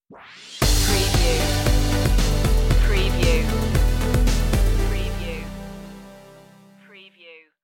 new dawn stinger

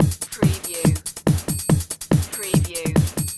Techno-Trance Drum Loop 142 BPM

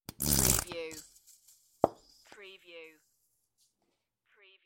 Squeezy Honey Bottle; beanstalk audio

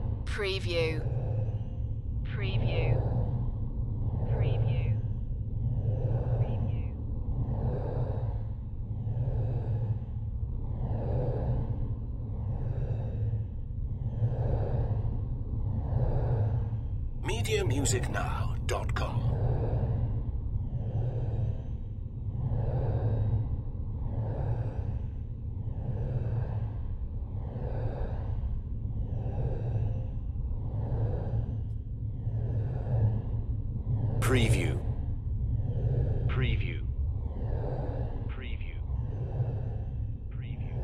dark pulse ambience loop

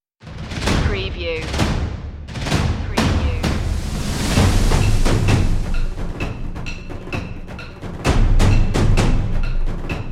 trailer percussion less drums

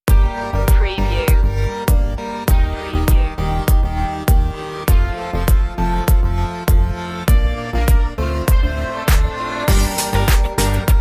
funky poptronic

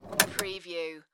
Pinball sound, ball roll and passing object

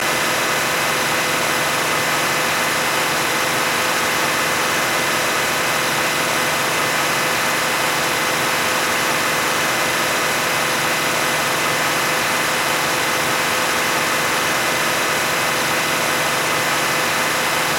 Car Mercury Sable Medium Rpm Loop

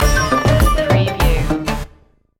funky india stinger

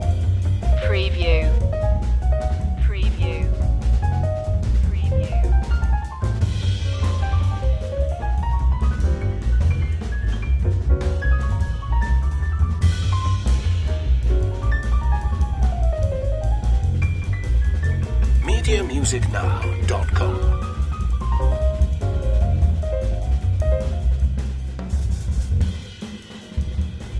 jazz fusion loop

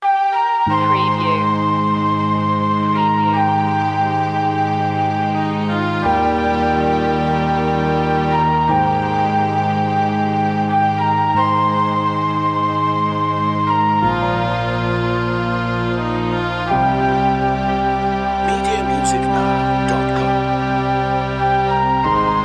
melancholic flute no piano

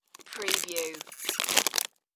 Potato Chips Bag Open

